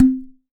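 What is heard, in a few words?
KAE KEEKE, HAWAII PERC; AKAI S6000 CD-ROM; WorldSounds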